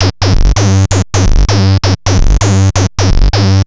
Analoop, Industrial

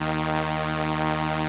SOLINA, String Machines

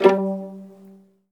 VIOLIN A; ILIO; Synclavier Strings

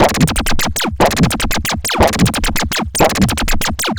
LFO, Noise; Sonic Foundry (Sony Creative Software); Prototechno

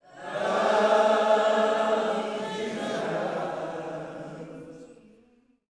LOVE JESUS, Vocal Gospel

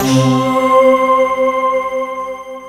WAVETERM PAD, PPG WAVE; OLD SYNTHS; Rubber Chicken Software Sample Bank

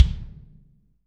BD, Real Mega Drums

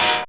Perc FX, Percussion; Dance Synths

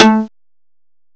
PIZZ LOW; M8; CMI Factory

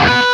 LEAD, Guitar; East West; Steve Stevens Guitar Samples Collection

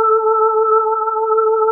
KORG A4; Hollywood Edge; Giorgio Moroder Rare Synthesizer Collection

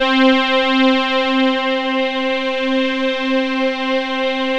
MOVE BASS; Masterbits; Vintage Voltage

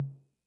Tom Mid Mid Decay, 808

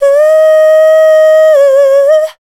VOC F, Dance Vocals, FEMALE

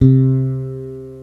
BASS C4, ELEC BASS; East West - Global Sounds

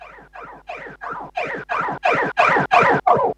rise, fx & sweeps; EZRollers

